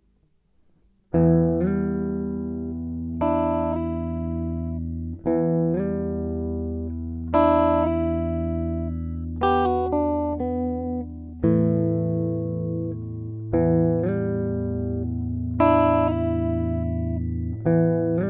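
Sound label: Em 116 guitar riff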